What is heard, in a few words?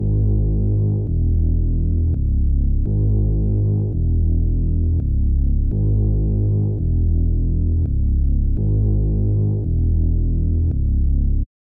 168 BPM G# Min Bass; Cymatics; Destiny Gold Expansion Stems; Balenciaga